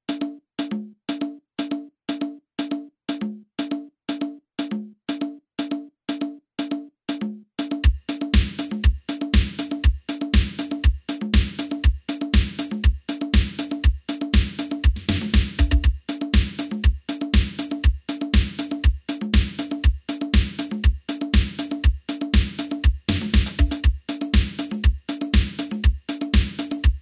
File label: roland tr626 layering